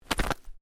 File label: Clothing Jacket Sleeve Flap; Movemement sounds for various articles of clothing; Dressing; Human